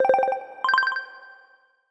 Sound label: ringer bubble; Mozilla; Boot2Gecko; ringtones